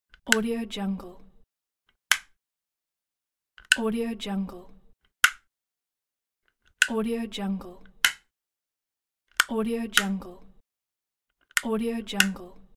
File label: light switch turning on off button click